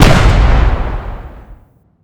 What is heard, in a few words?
Cannon Ball; MJ CannonBall